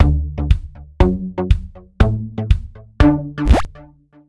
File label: Revival Tune; tallowmere gamerip 2014